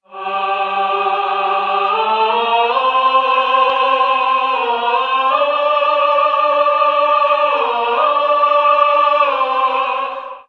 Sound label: voice choir; Sad; Loops